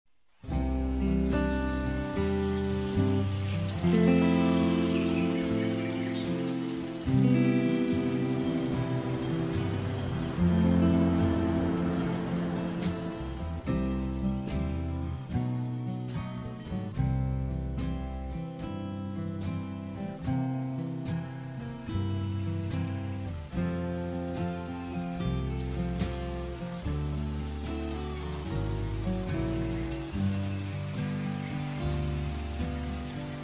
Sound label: intro birds and waves slower